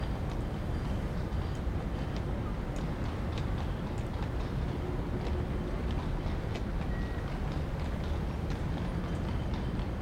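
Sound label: London apartment street exterior evening; the 39 steps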